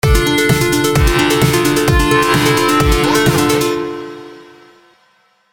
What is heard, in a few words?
nice loop